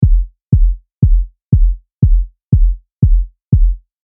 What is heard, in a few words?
sampling kick